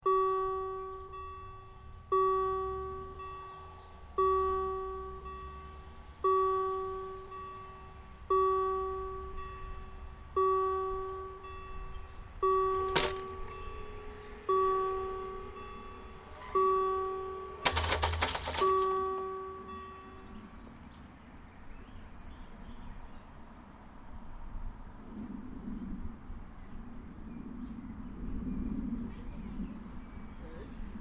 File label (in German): akustisches signal und sich hebende und schranken am bahnubergang; vogelsanger bahnubergang; sich hebende und senkende schranken